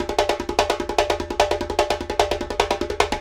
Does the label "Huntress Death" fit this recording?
No